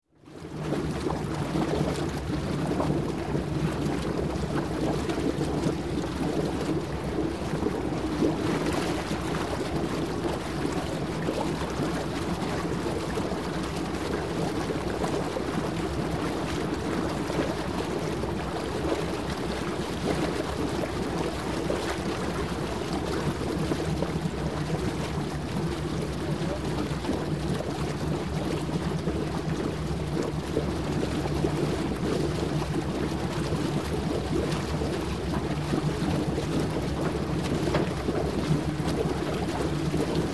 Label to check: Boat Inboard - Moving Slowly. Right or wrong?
Right